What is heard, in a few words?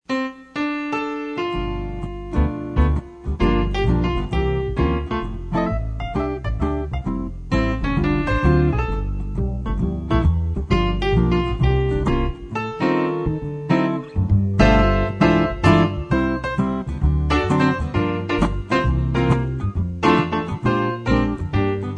Piano, Guitar